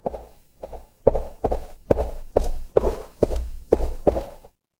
footsteps gravel

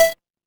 feedback confirm

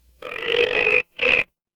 animal frog

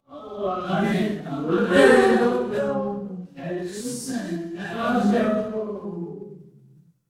crowd gasp

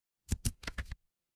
paper fabric page turn

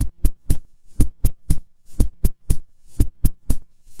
ui loading

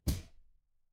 impact punch

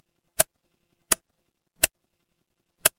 mechanical switch

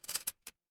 door lock

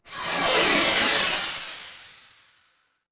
magic scifi spell

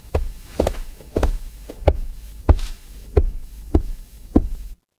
footsteps wood

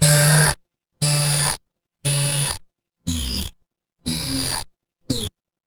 fire electric zap

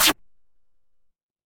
retro game laser

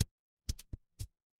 impact bounce

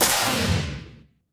magic scifi laser

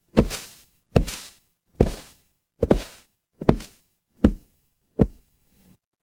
footsteps wood

